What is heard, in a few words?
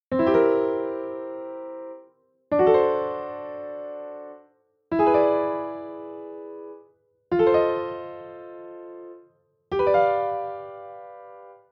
chord name 7 sound